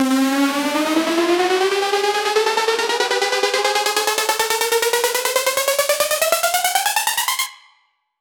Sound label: 140 bpm uplifter, dance